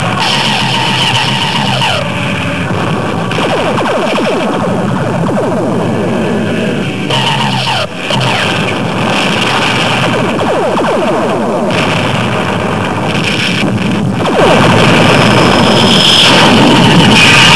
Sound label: battle; Desktop Themes; War of the worlds